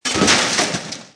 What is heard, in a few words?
wheel Drop; merge-dragons-2